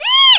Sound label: eek; rashdemo